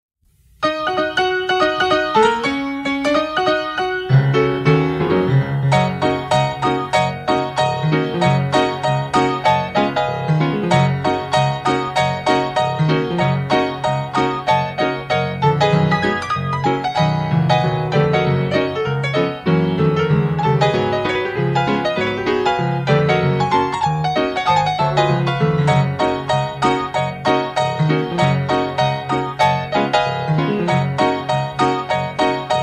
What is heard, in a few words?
Player Piano; A piano is one of the most difficult instruments to record.; Glen s Player Piano